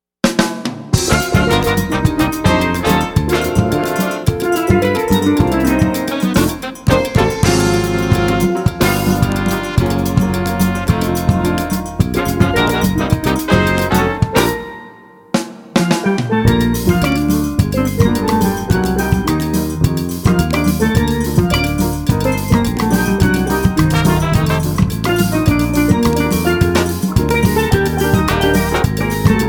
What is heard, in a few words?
Caribbean Jazz